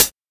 nice hi hat f sharp, Royality free pedal hi-hat one shot tuned to the F# note; hat samples